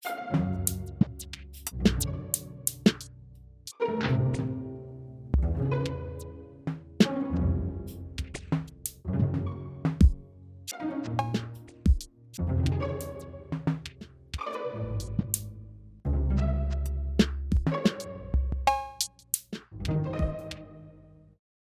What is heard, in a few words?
strum on